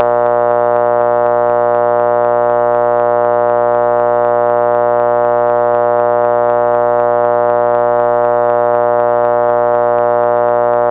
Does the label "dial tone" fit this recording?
Yes